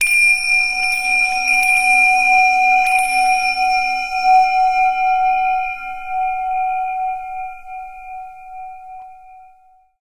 zen tingsha; Source Engine; tf; japan fundraiser

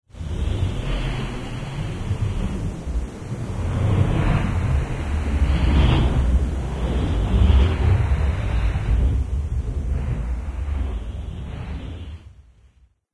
citadel outside fx; ambient; city; source engine; episodic

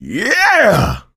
positive noise; voice; source engine; left 4 dead 2; survivor

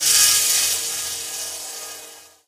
lightbridge elec, footsteps

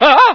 scream, scientist; hl1; Source engine

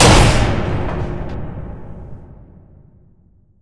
crusher impact; Source Engine; Portal 2

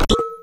ui coop hud click; Source Engine; Portal 2

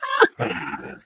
ballbot hi

